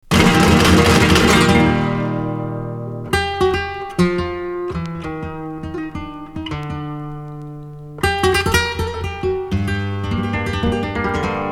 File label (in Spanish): guitara de oro, cultures tsiganes